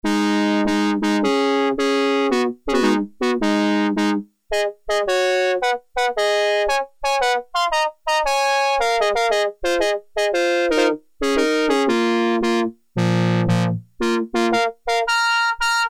Brass in 5ths, Korg Poly-61M

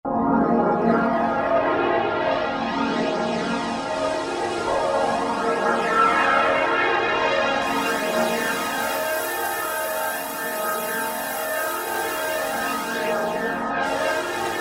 Galaxia, Roland V-Synth

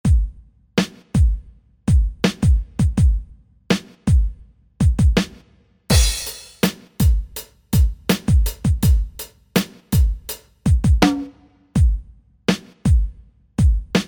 Kit, E-mu Proteus VX

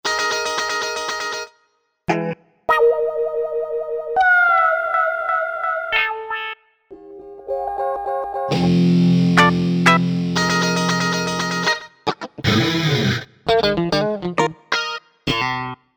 Guitars

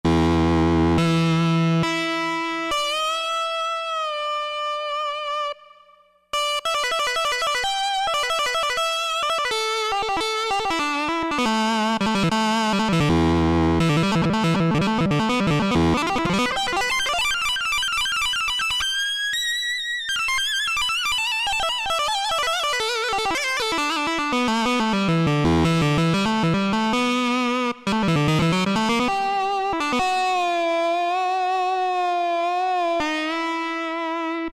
MiniODLead, Korg N364; Factory Prog demos

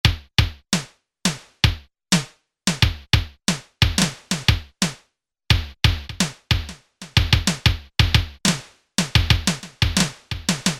Kick+E.Snr, Kawai K1m; Factory patches - I banks

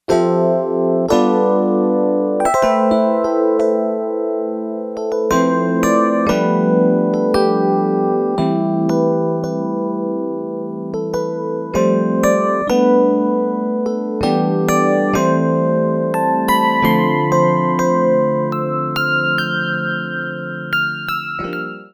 Big Chord Elec Piano, Yamaha TX802; Performances; Factory Patches

